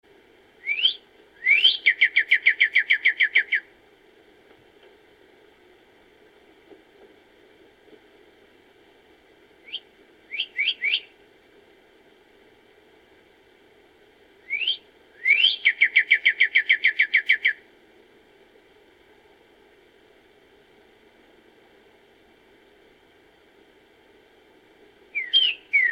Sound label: bird sound